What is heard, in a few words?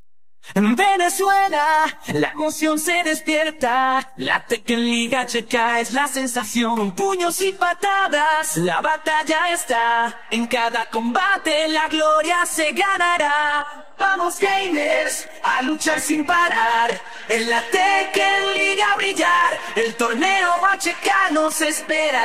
vocals